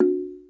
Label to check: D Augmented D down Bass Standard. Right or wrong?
Wrong